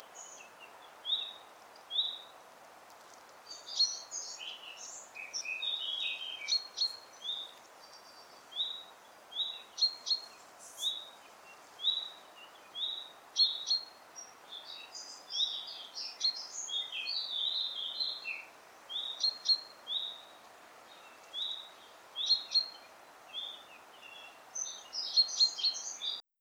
Common Chaffinch Fringilla coelebs, common chaffinch call; Bird Call